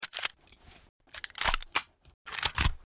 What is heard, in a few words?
arx160 reload; weapons